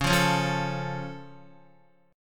C sharp Augmented down Guitar, chord; strummed; JGuitar

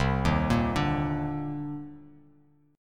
C Minor 9th, Bass Standard; Cm9 arpeggiated; JGuitar

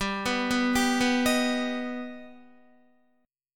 G Augmented, Guitar, chord; G+ arpeggiated; 14,14,11,12,11,11; 4F,4A,5C,5G,5C,6E

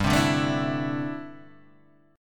D 9th Suspended 4th, chord

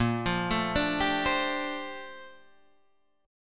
A sharp Major 13th, Guitar Standard; A#M13; arpeggiated; JGuitar

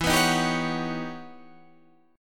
E Minor Major 13th E Guitar Open D

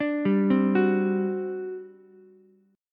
G Major 7th Suspended 4th, G, down, Ukulele; GM7sus4 arpeggiated; JGuitar

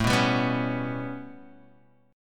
B 7th Suspended 2nd Sharp 5th A

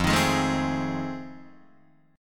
F 7th Sharp 9th, Guitar, Open D

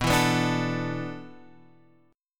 A Suspended 2nd Suspended 4th

